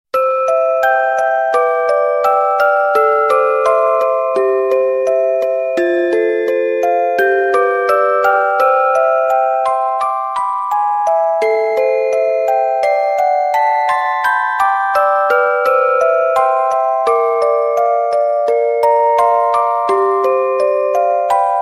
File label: Cute Song